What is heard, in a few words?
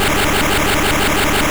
ThunderShock; gen 1 attack moves; Pokemon; RBY